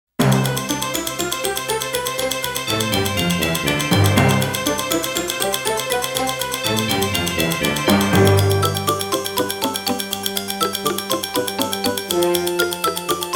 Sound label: Comedy Style; Sound Ideas - Comedy Styles